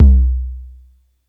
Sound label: BD Moebius Stylo, DRUMS; Polyend; Legowelt